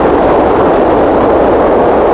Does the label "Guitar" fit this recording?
No